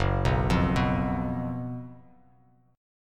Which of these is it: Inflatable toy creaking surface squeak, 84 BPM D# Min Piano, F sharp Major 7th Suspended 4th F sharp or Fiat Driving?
F sharp Major 7th Suspended 4th F sharp